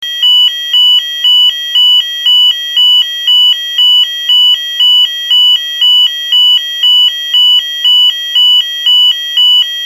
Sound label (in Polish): ton